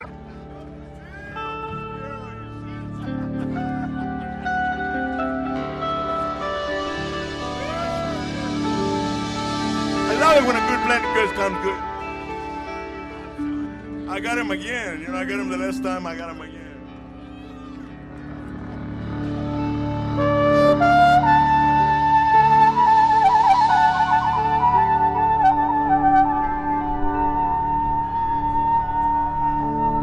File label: Improv Jam